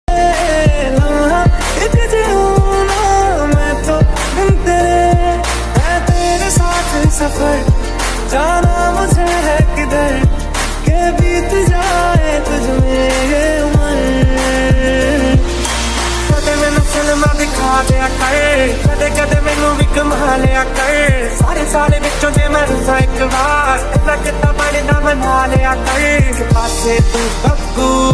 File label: dj remix hindi ringtone